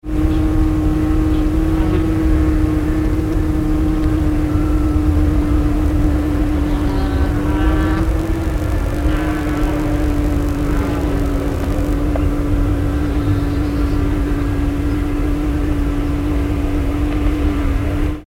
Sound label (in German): wespe; was summt